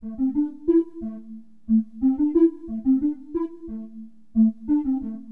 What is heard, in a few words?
OB VOX, HipHop, Keyboards; LiveAct Pool 1